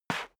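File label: step sneaker concrete slide short, footsteps; schritte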